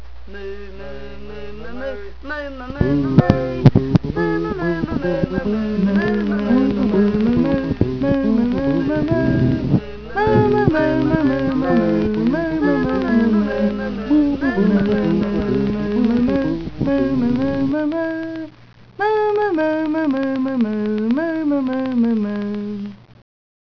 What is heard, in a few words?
moo